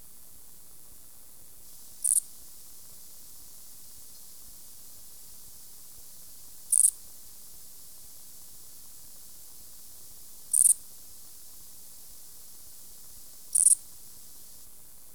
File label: oblong-winged katydid; Insects